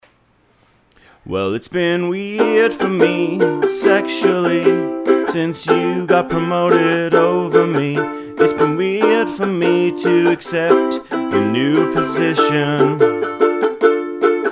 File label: ukulele